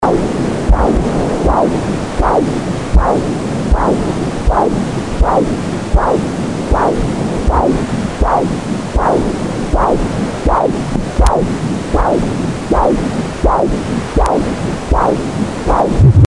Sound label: heart